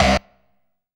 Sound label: RAVE, RAVESYN